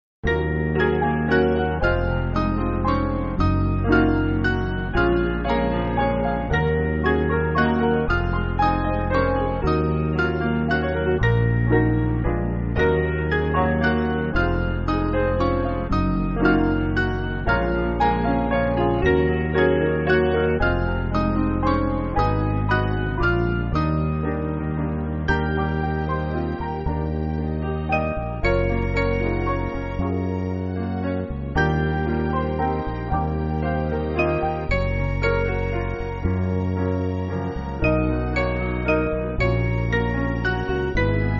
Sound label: Bell Piano